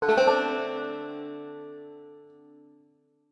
banjo chord; Summer Catchers

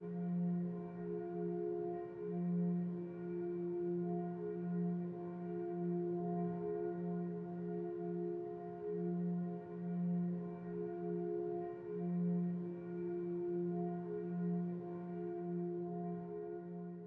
Meditate Bells; Fragile Fighter

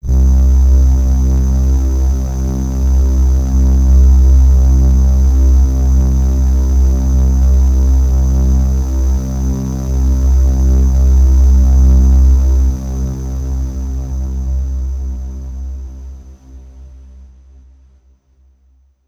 Downtown Overlay Drone

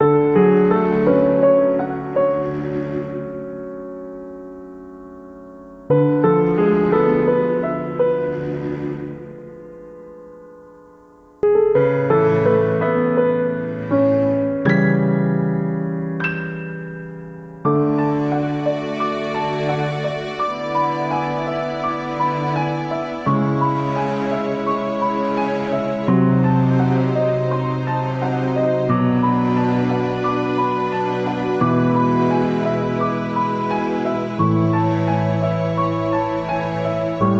BGM sad; witch spring 3 android ios mobile gamerip 2017

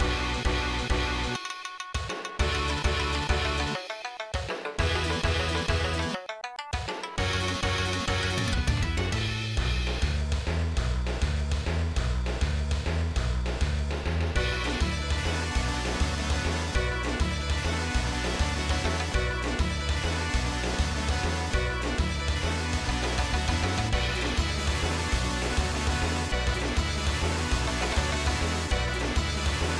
Stage Loop

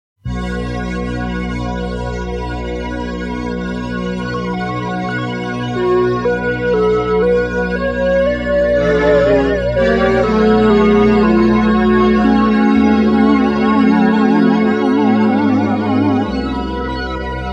Old Synth Suite; project hubbard kickstarter 9cd set; The Curse Part 3

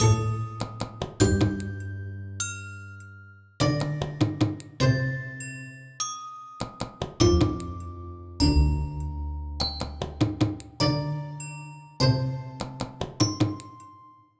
Mood Music

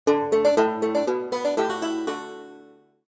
Cowboy Banjo Chords

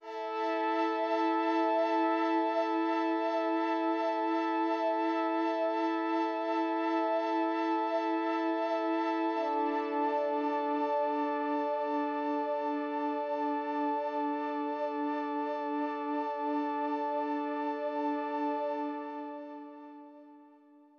Pads; super crush k.o.

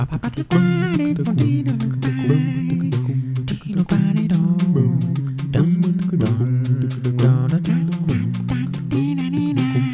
Background Music; Smeshariki Round Company

